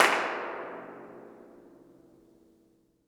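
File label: Reverb in abandoned hospital; IRL Recorded; Reverb Enviroments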